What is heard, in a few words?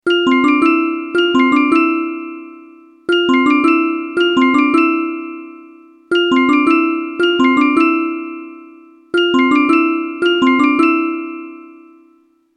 bells ringtone